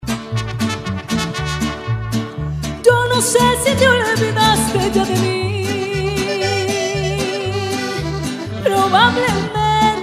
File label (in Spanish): RANCHERA